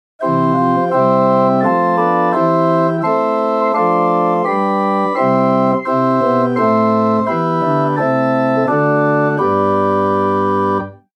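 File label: Holz 8 Prestant 4 Flachflote 2, organ; Buzard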